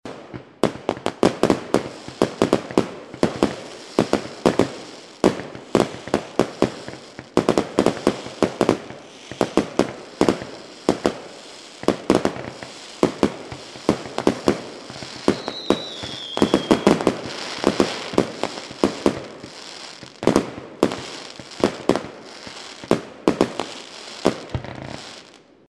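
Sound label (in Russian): хлопки и горение как россыпь; звук салюта или звук фейерверка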